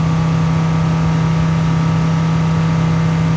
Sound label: Viper GTS Loop, Automobiles